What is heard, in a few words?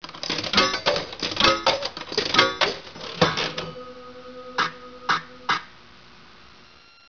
casino